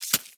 Arachnoid Micro Step Snow; Warframe; Corpus

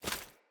Foley Action Armour Run; Mother Armour

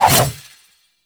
Two Handed Nikana Spin Swing; Warframe; Duviri; Dax; Weapons

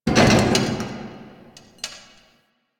Car Creak; Warframe; Ambience; NineteenNinetyNine

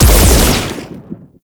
Overhead Smash Attack Impact; Enemies; Warframe; Grineer Nightwatch

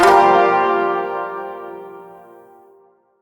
Shawzin Blues Chord F; Chords; Warframe

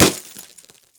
Glass Bullet Impact; Warframe; Ambience; NineteenNinetyNine

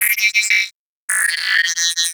Computer Beeps; Warframe; Guild

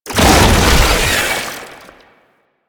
Proto Infested Babau Tentacle Flail Stop, Enemies

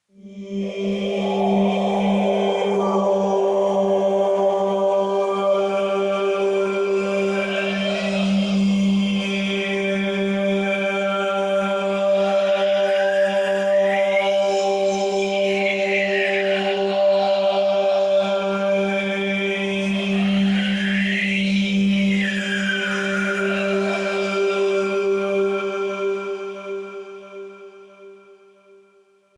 Choir; Warframe; Sentients

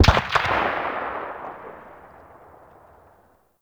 Weapon Enemy Tail Outdoor; Weapon Enemy Tail Layers; Warframe; Weapons

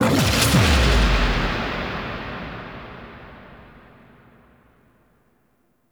Howl; Leverian; Voruna; Warframe Powers

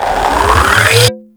Hunting Bow Draw

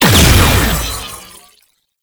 Research Pod Shield Destroyed; Warframe; Jade Shadows; Ascension

